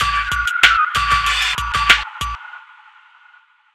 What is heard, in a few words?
Hip Hop Beat Choppy